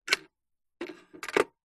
household telephone vintage rotary style pick up then hang up receiver gently